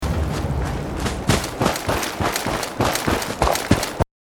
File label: footsteps grass; fallout 4